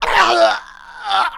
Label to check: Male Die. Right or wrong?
Right